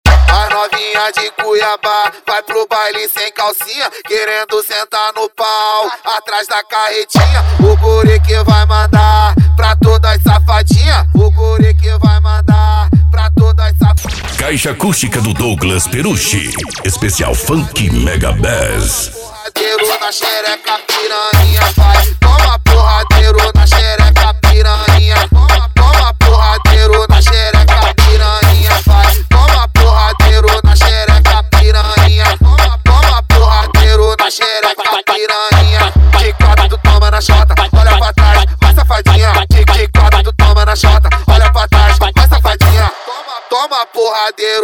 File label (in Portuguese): especial funk mega bass